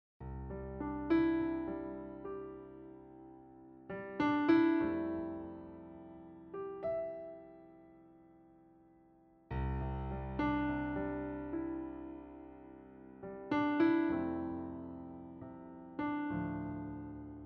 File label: Italian Grand; Amazona Demo